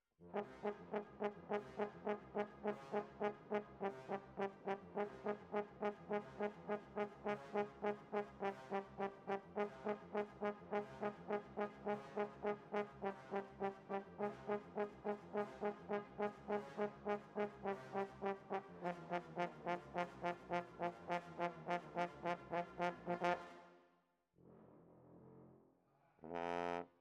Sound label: Horns